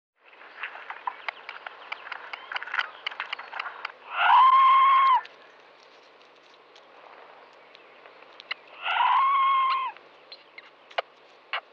Veverka obecna mlaskani cokani mroukani

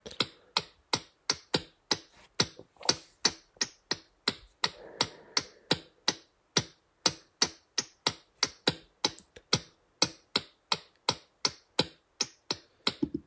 Netopyr pestry

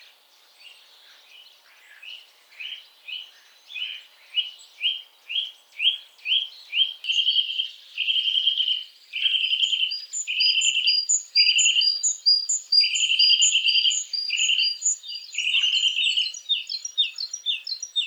brhlik lesni Sitta europaea

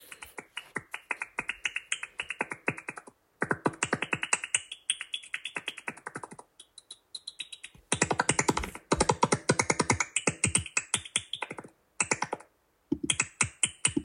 Netopýr hvízdavý; netopyra hvizdavy

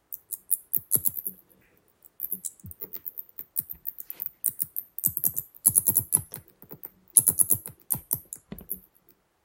Netopýr večerní; netopyra vecerniho

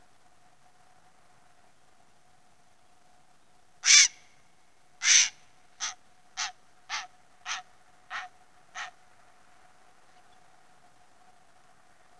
volavka popelava Ardea cinerea

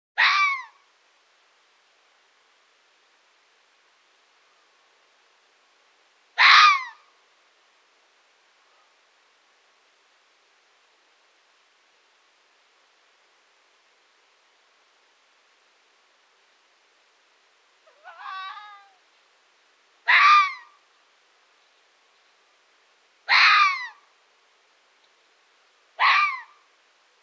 Liska obecna skoleni v dobe kankovani

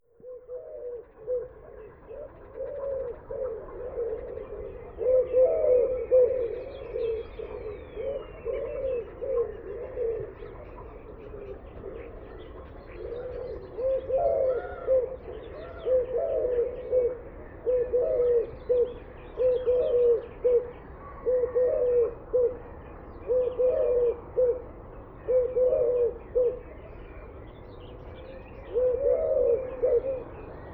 hrdlicka zahradni Streptopelia decaocto

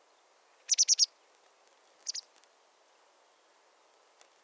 konipas bily Motacilla alba

